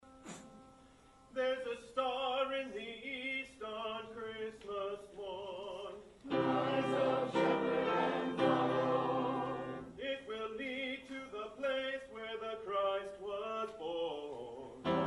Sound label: Rise Up Shepherd, and Follow w Piano